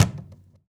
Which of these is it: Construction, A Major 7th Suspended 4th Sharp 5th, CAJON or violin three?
CAJON